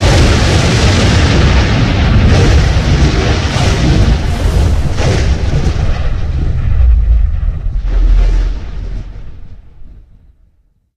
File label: Piezo Really close, Explosions; DynamicEuropa